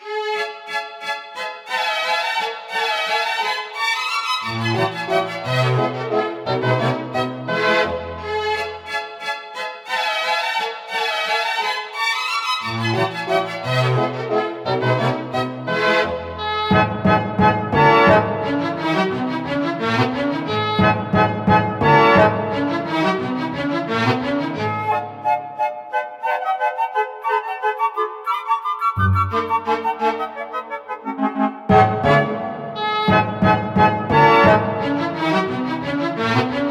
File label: Minuetto loop fast